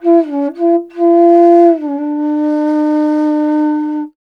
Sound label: INDIA BASS, INDIAN SET; Spectrasonics; Heart of Asia